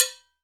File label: MAMBO BELL, Latin Hand Perc; E-MU Producer Series Vol. 2; More Studio Essentials; Composer